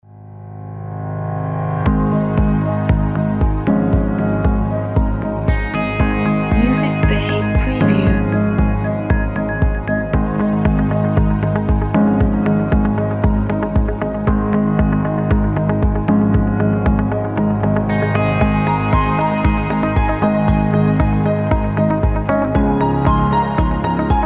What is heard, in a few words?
inspiring waves